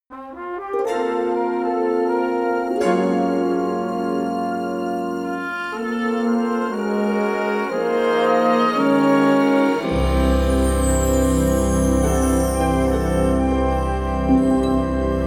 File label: Fanfare; Network Production Music; NM111 You're The Very Best! (Multimedia)